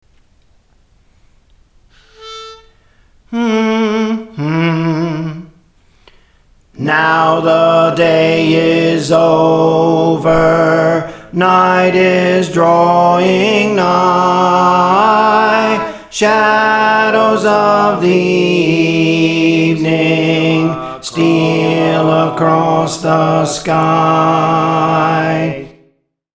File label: Soprano, Songs; Praise For The Lord Training; Now the Day Is Over